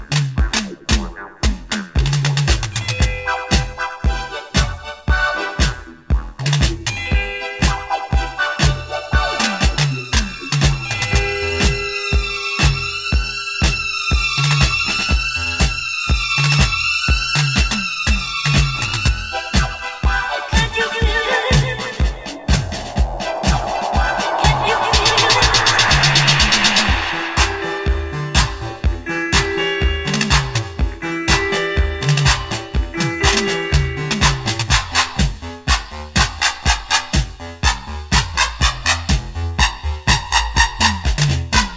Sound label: Jump Trax (111 BPM To 125 BPM)